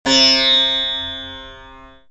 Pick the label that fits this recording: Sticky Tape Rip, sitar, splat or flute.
sitar